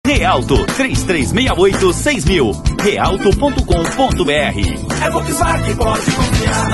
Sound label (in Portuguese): final jingle